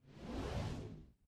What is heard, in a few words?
Ui Platforms Transition